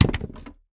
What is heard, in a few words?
Wood debris fall